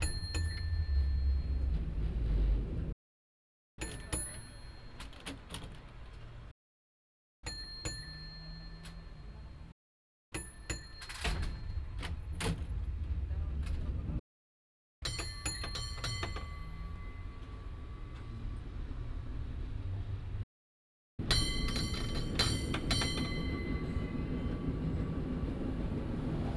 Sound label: Cable Car Bells; San Francisco